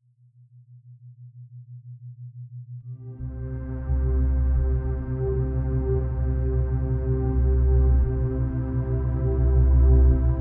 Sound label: Low Slow